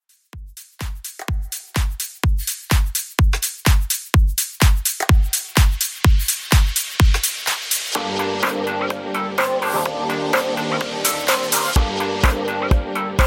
extended mix 126 bpm

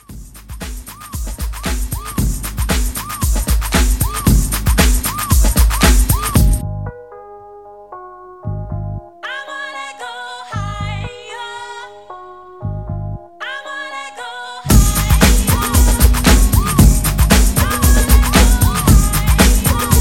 90s Old School Re Drum

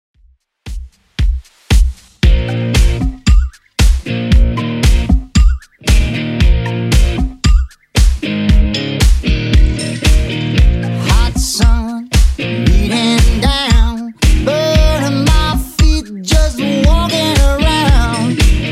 90s Redrum 115bpm

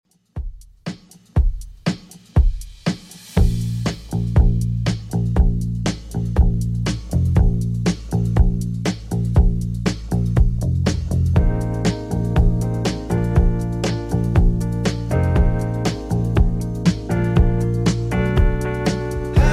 70s Redrum, 120 bpm

